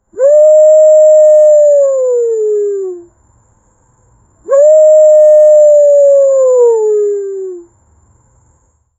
cartoon owl hooting friendly

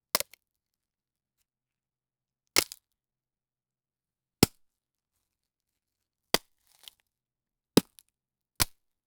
the sound of breaking soi, digging

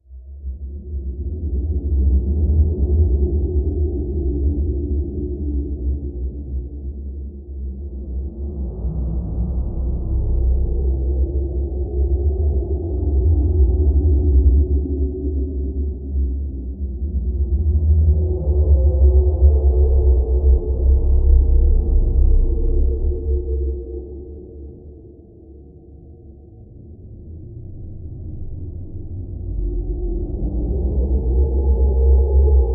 scary deep dark silent

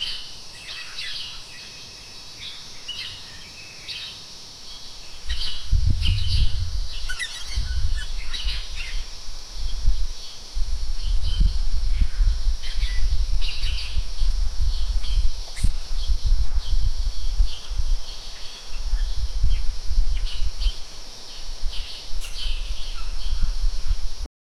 garden of charleston, summer

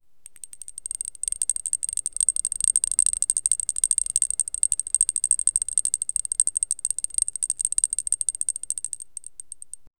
glass marble bouncing on, rapid

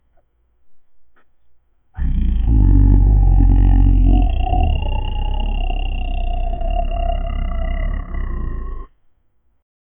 low rasping undead growl; gritty

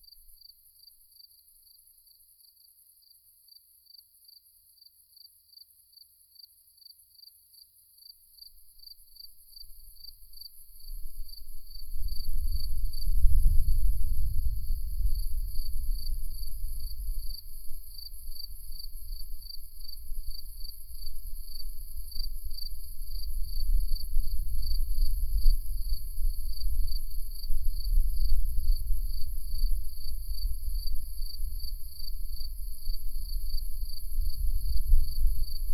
the chirping of daytime insect

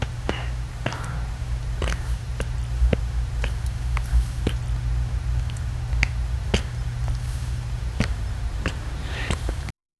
soft background whispers; gasping

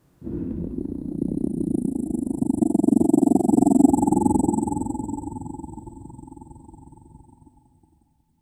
snake sound, rattle

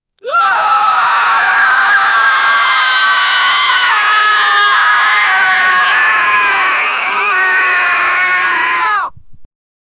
goblins screaming

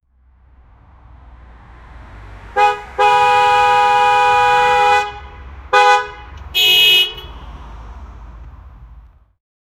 car horn passing fast

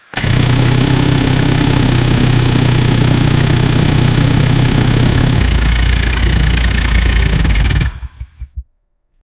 realistic 5000 hp v8 engi, engine start

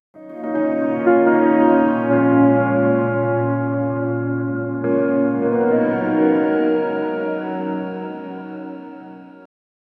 transition to soft minor, cello

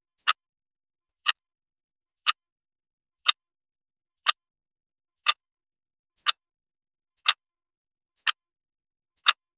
faint ticking clock; timer

